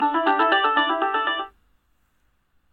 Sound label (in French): sonnerie